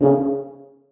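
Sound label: tuba staccato c#3; Sonatina Symphonic Orchestra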